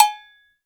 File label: Cowbell Hi, Roland BK-5 Percussion